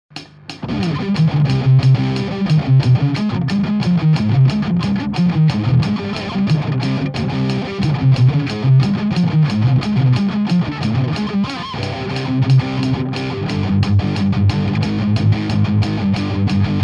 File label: trash riff